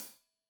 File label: Hat Loose, Hi-Hat, Cymbals Stereo